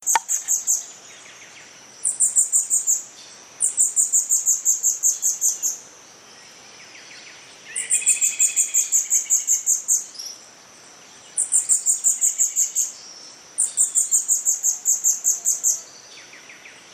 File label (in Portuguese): Som Beija flor de garganta verde